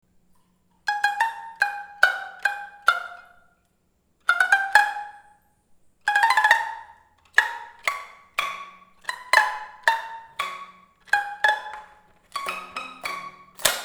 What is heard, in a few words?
STYROFOAM HARPS